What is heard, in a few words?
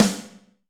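Snare Room, Alesis D4; O Tone; 300 Drum Machines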